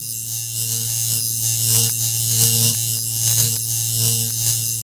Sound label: Elect Arc Variable, arcs & sparks